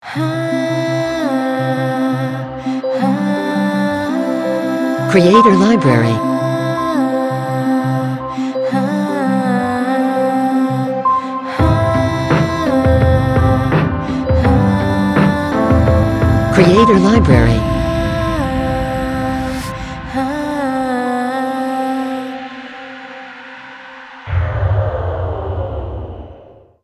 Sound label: Void Logo; Logo-Idents